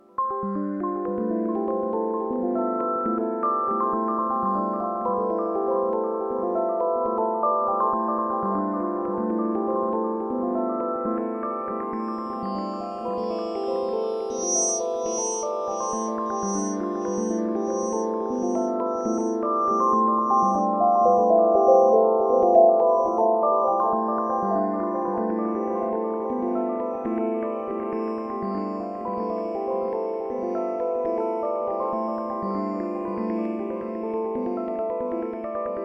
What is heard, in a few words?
MICROKORG; Drug Muppet Harps